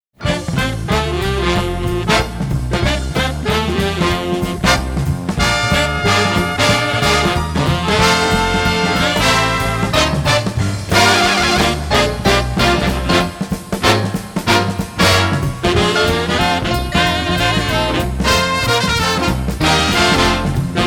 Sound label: Bell Track End alto